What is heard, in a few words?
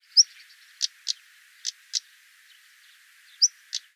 Saxicola rubicola